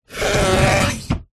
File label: household drawer wooden open modern stuffed with paper scrape